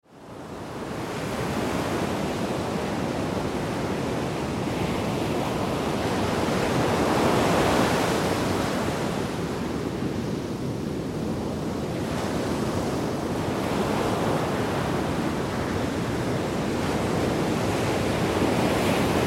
sea wave

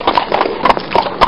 horse

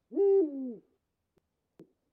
owl

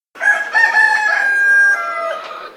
hen

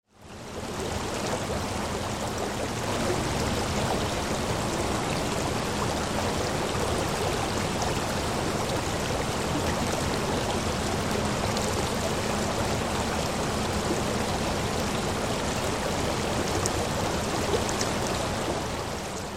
sea

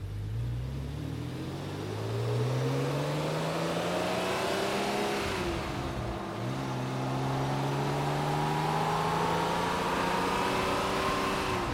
car

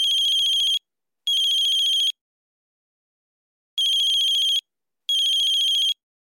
mobile

